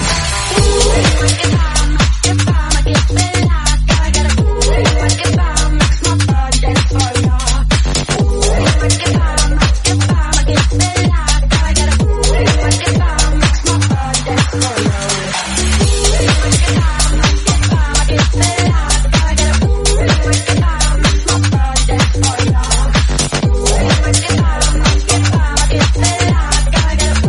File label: 126bpm G; Vocal House